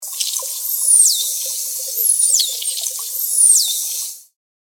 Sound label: Humpback Dolphins